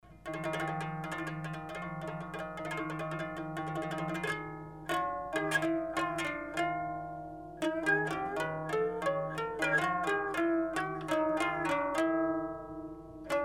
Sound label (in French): tige entre, touchers de la harpe